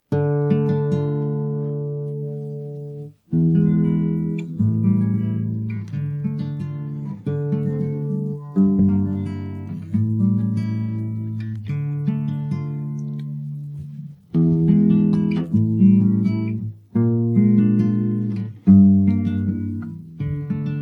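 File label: backing track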